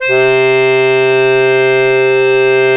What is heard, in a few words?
ACCORDION C3; USB Soundscan vol.63; Balkanish Traditions; AKAI